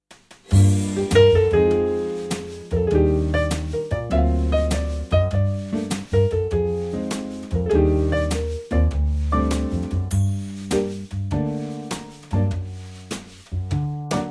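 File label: Gm, Backing Tracks for Male Vocals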